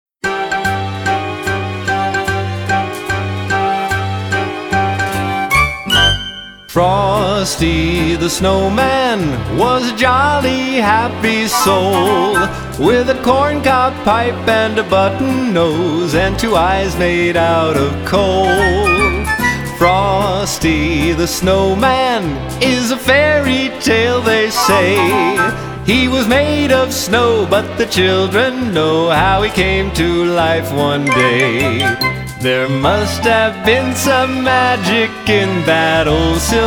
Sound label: jazzy christmas cover version